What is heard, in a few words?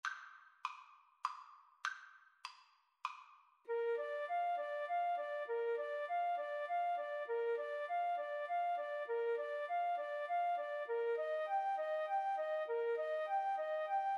Flute duet